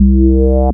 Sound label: Bass Shot C